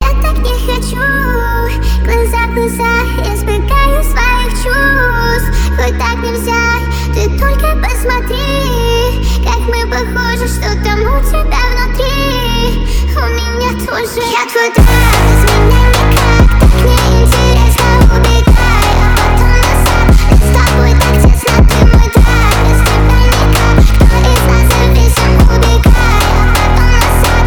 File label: Phonk Remix